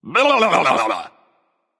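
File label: tongue, non-verbal; smite; voicepack; merlin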